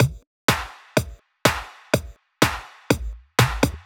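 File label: 124 Bass Drum Clap, Drum Loops; Tatanka; 124bpm; Nu Dance Electro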